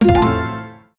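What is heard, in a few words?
a short cinematic logo sting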